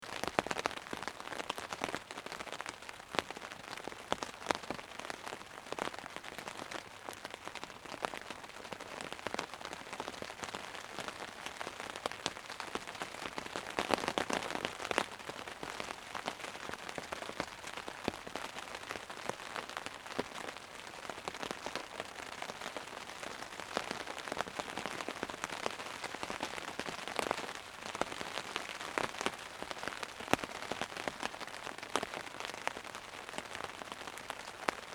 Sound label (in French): Pluie Sous Tente